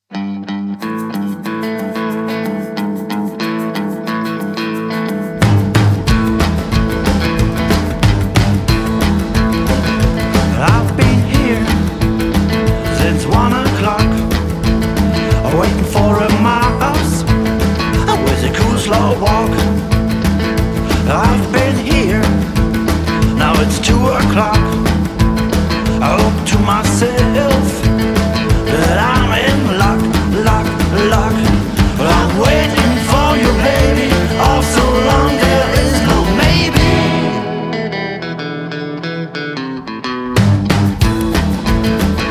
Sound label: Rock`n`Roll, Rockabilly & Bluesbopper Band